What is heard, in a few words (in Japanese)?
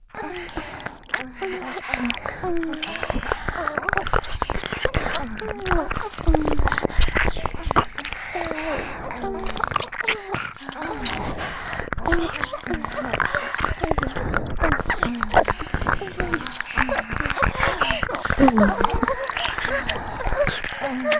キス＆耳舐め＆喘ぎループ, ASMR